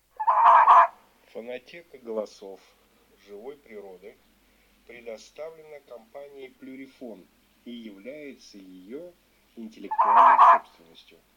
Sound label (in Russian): Лиса брачный зов Volpe amore; plurifon